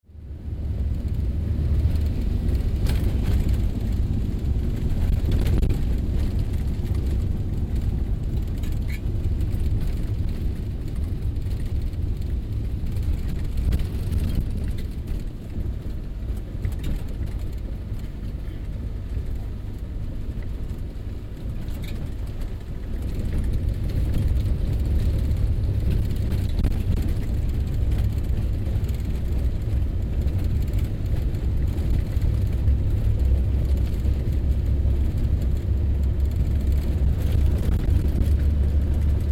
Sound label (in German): Bus, Naryn; 2006 kirgistan